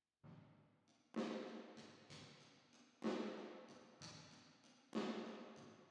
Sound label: Drums Verb